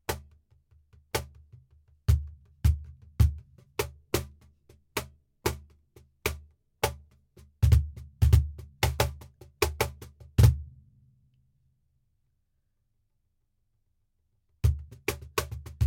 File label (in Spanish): cajon flamenco estudio; natural